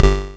Bass

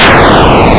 Fire; Defender

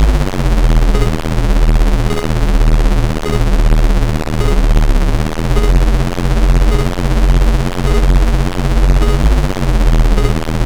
Tron Tank Loop

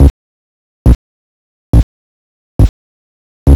Space Invaders Bass Lo

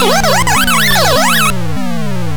Hit; Galaxian

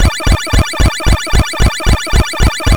Fruit Thump; Ms PacMan

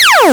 Fire; Galaga

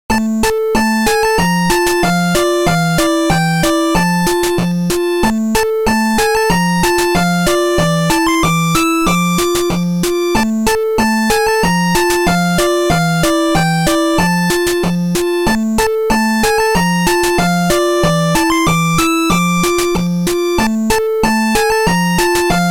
space pirate loop

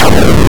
Kill; Joust

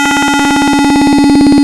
Death

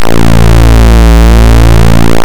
Coin; Defender

